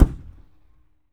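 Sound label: low boom; 3D Sound - 3D Keys VOL-1; MEAN 3D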